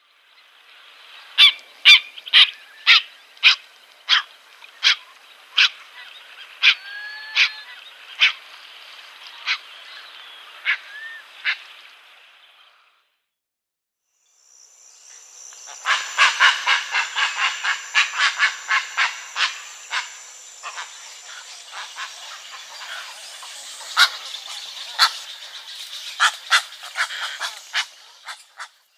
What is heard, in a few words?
Yellow-crowned Night Heron